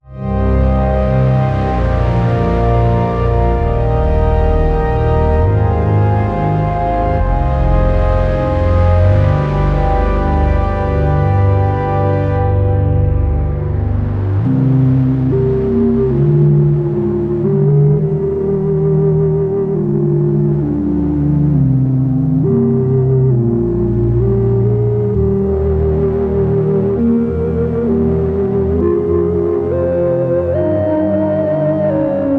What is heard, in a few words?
Synth of Church